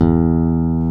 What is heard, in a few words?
BASSES, Roland